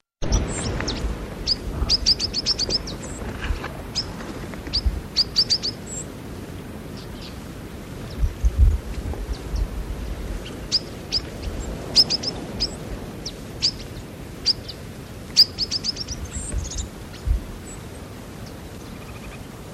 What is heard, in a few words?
stripe capped sparrow